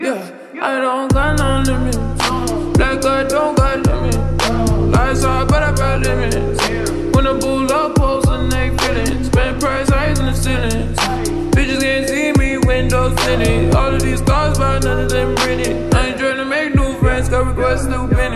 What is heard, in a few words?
slowed reverb